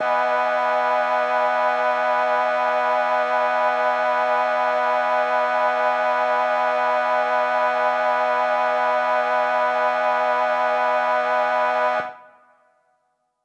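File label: Mixed Winds E major 7, synth orchestra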